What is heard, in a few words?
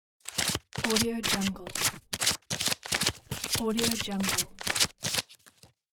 paper money counting